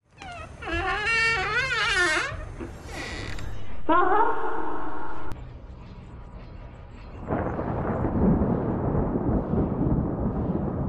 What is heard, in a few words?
the empty corridor